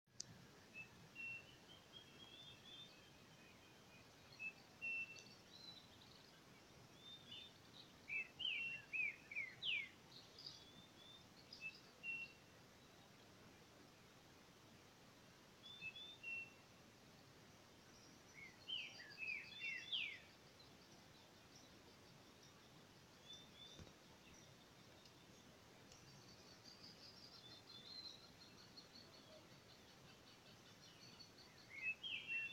Striped cuckoo call; Gotas de Agua

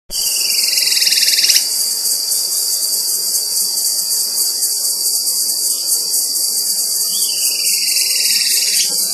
Platyrinchus mystaceus

